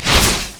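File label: rolling thunder attack; LoL; volibear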